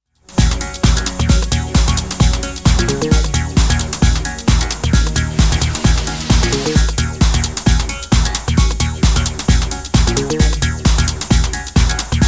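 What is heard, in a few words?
noise lfo loop fuse mix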